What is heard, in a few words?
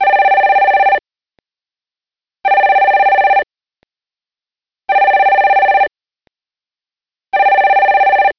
telephone; css; cabaret